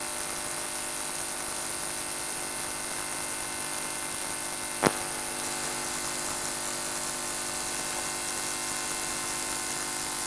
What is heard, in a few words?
circuits electric hum buzz noisy; fvtt sonniss gdc audio